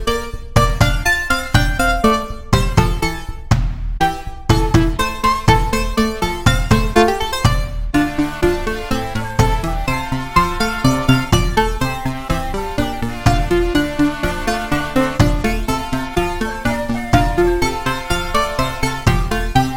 key oriental lute

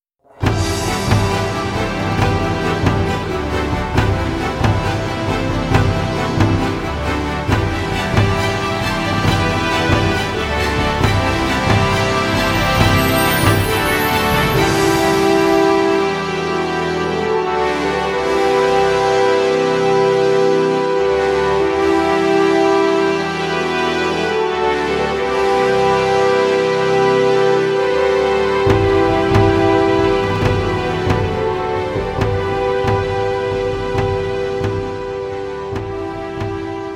brass

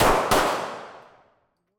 9mm gun shots

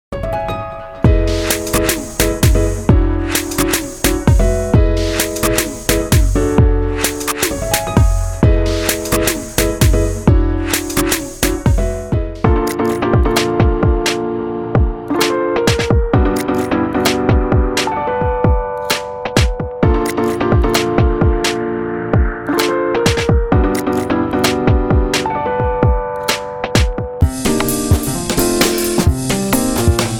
neon acid jazz neo soul

